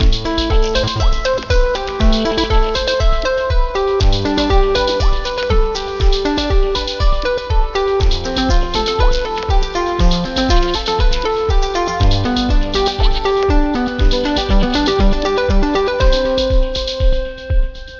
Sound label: Morning Sun, alarms; Mi Mix 2S Stock Ringtones